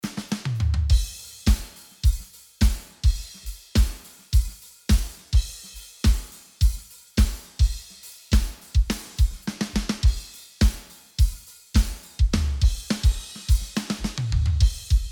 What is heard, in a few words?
Drums; klarkteknik; pultec shootout